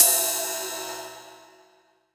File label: Ride, Cymbals